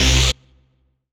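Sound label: Rave Synth, Dance Mega Jungle Rave; Best Service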